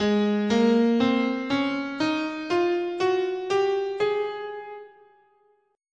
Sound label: Ab Bebop Dominant